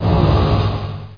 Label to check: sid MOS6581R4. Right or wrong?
Wrong